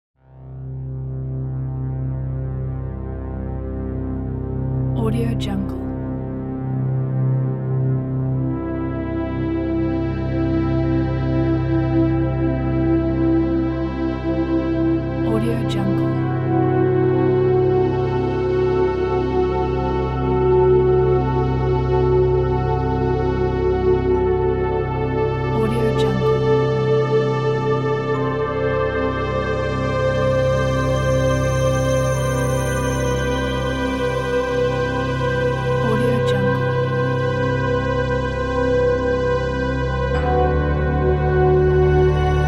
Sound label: soundscape ambient; helix nebula; greensauce